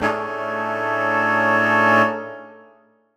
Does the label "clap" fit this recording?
No